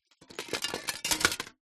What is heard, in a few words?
plastic shards broken thin pieces set down; zapsplat